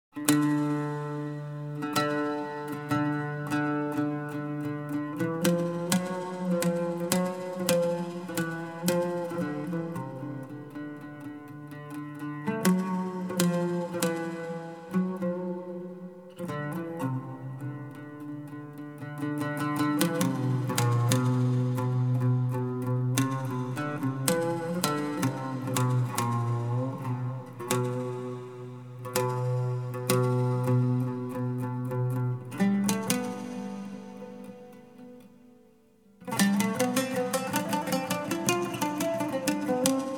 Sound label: Semi Improvisation